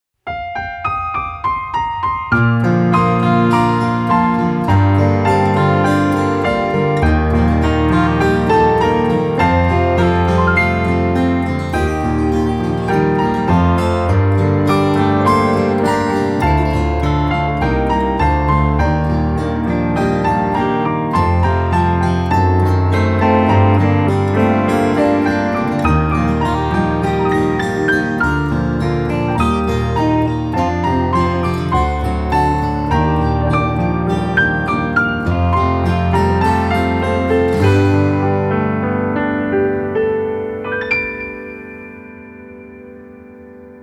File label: Off Vocal